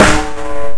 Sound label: Snare Eb2; guitar rock tour